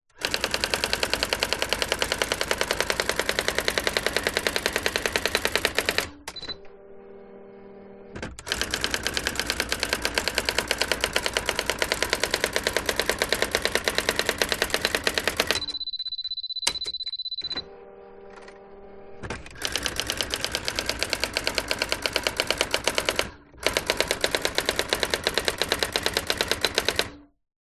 office electronic typewriter typing fast constant hard brother ax 450